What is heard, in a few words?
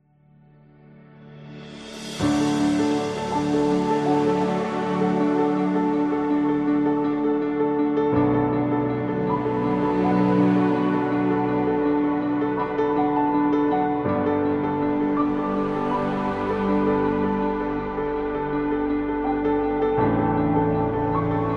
Calm